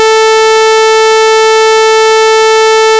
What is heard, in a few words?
Sawtooth Wave